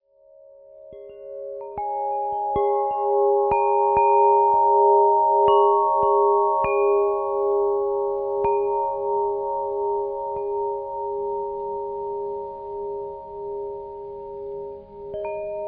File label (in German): Traumfänger klangvoll, klangspiele